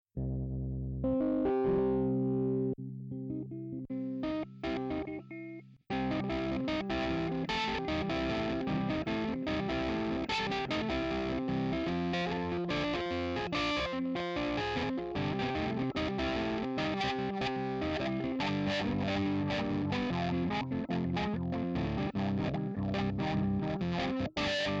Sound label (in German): hohner pianet t reverb git effekt